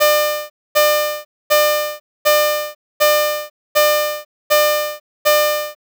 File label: buzzer